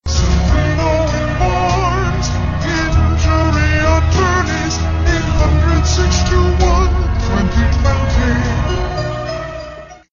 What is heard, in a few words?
Tune SLOW; WFAN